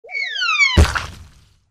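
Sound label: fall from height and impact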